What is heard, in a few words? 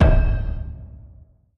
scatter landed; godsofgold